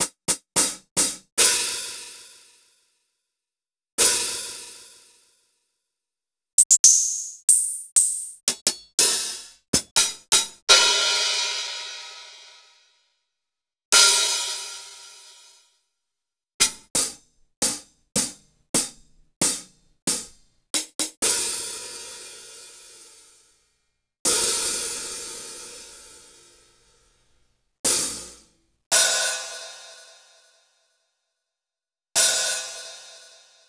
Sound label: Roland BK-5 Hi Hats